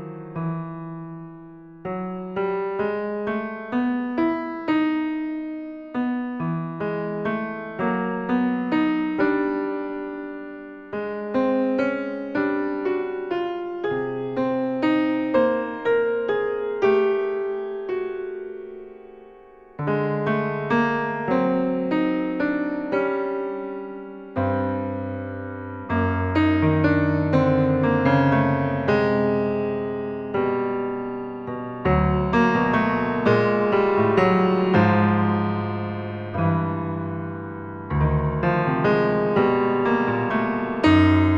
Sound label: Piano Only; I.Bergman